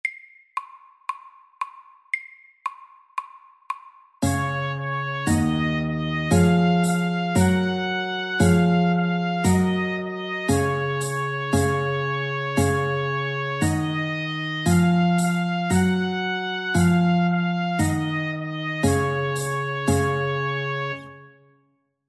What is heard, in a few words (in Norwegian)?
Folketone MIDDELS